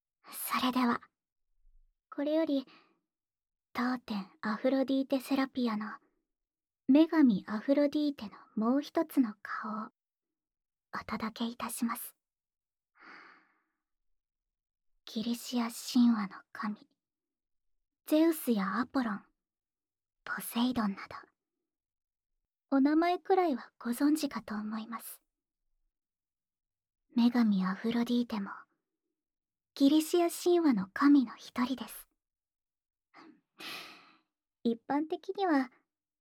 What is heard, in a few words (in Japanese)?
耳舐め手コキ, ASMR; ASMR版